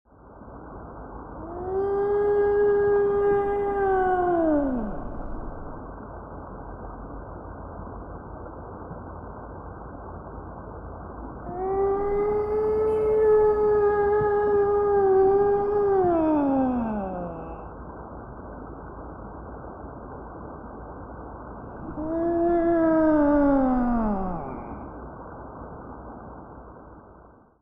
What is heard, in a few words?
Whale call underwater perspective